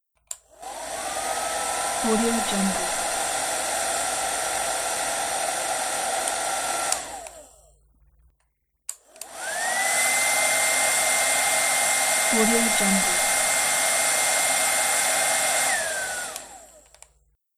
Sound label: hair dryer